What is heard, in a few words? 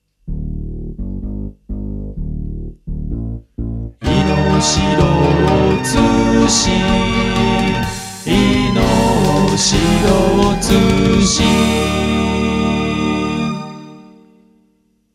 News Jingle; Shiro Ino News Jingle 2 -2024 Mix- (Mono)